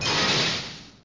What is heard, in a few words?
present Disappear; graveyard